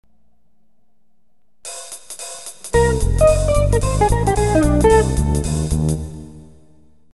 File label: jazz